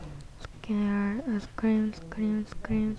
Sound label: scream